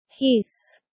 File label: hiss